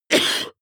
cough male single attenuated soft short; koerpergeraeusche

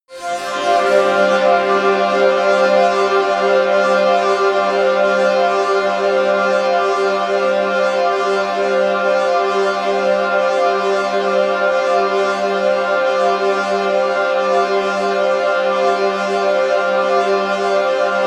tense air, music elements

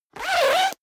zipper down jacket open fast; objekte; reissverschluss

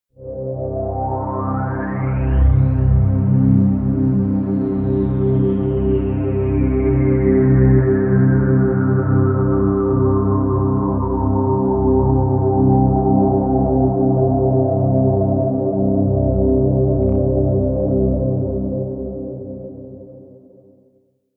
pad sweep vent; flaechen

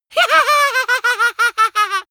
goblin laughing, comedy cartoon vocals; soundpool

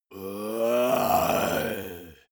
monster growl unmotivated; kreaturen

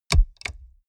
mechanics bits; industrie-wirtschaft; mechanik

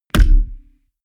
heavy bass saturated damped, hits impacts; whooshes rises

